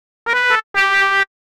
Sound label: 125 Fluty Lick E, Keys, Nu Jazz House; PowerFX - Nu Jazz House